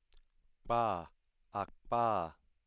voiceless labial-velar stop

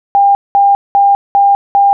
800 pulse

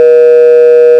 480 plus 620 Hz

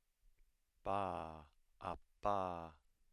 voiceless bilabial stop

